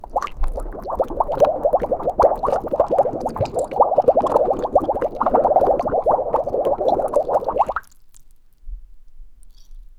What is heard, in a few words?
swimming in bubbles